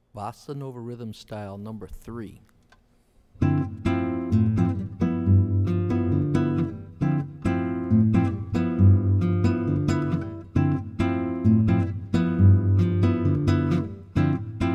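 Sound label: Bossa Rhythm Style, guitar; jazz chording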